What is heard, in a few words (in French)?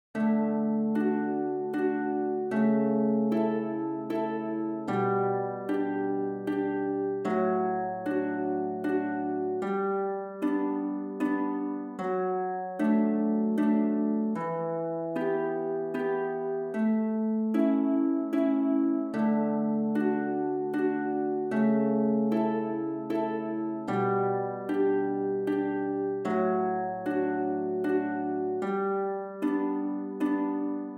HARPE